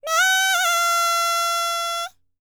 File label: BULGARIAN, World Voices, BALKAN